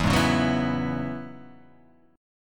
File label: E 6th Add 9th E down Guitar Half Step Down